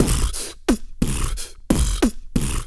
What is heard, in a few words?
SLOBBER, Vocal; Blues & Soul